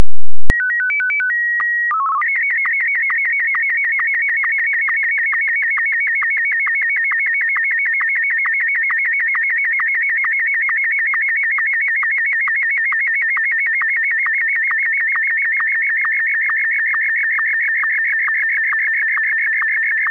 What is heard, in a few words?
sstv; 22050 Hz, mono; 320×256